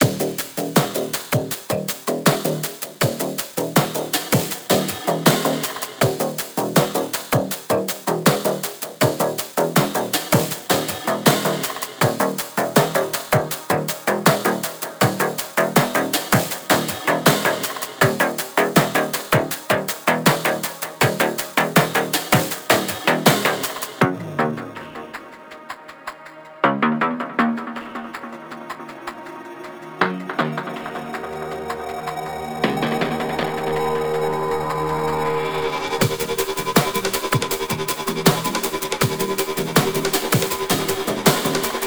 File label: Industrial Tech Savvy Intensity; net-gunner